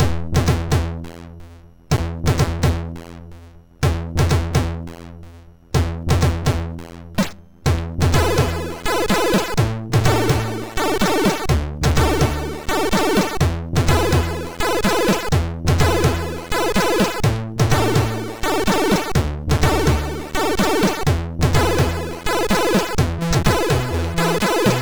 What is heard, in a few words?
Tune, sid MOS6581R2